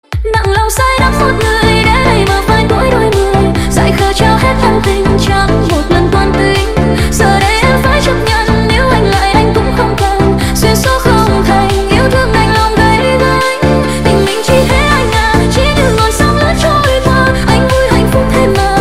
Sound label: remix